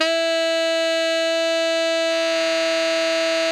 TENOR E 4, Saxophones; Greytsounds; Philip Wolfe David Crozier's Saxophones

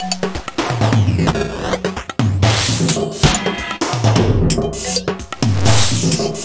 120 NEON FX 1, Industrial Loops

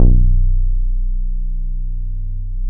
DANCE FLOOR, Bass Analog & Synth; USB Soundscan vol.12; AKAI

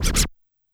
Scratch Pull, Yamaha PSR-E323, Electronic Kit

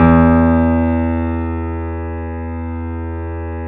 E 1, Keyboards Acoustic, GRANDP ST; USB Soundscan vol.17

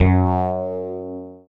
STRANGE BASS; Masterbits; Dance Trax

